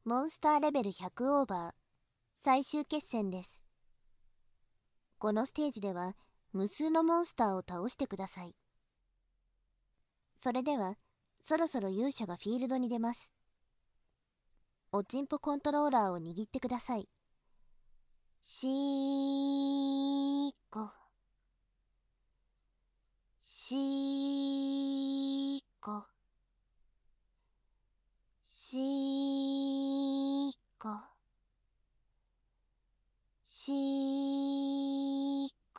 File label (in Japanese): 耳舐め, ASMR; レベル100～耳舐めモンスター軍団の総攻撃～